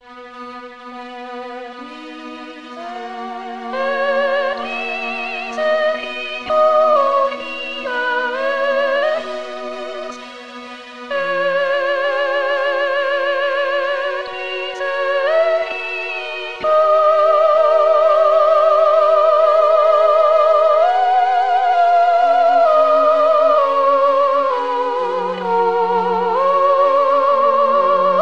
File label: sop et misericor